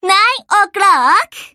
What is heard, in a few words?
clock